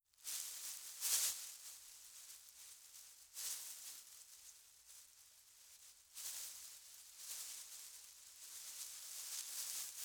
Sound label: Pom Pom Movement; Fairlight Sound Lib